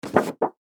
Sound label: Office Poster Board Pick Up; SFX Bible